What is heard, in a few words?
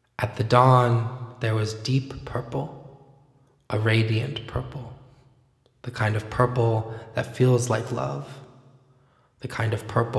genesis just vocal